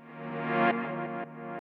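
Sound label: 175 drum and bass Synth Ebm; Dance DJ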